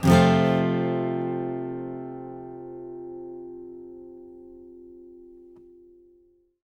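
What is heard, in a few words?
acoustic guitar F major; Sampleheads - Whole Lotta Country 1 & 2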